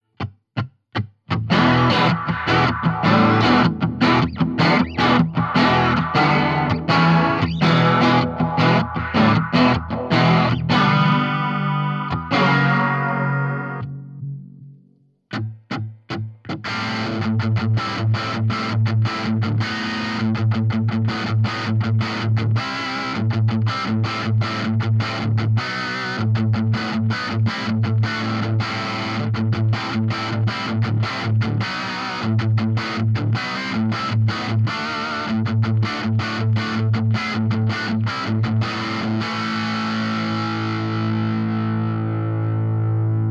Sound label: Fender Line6 MD421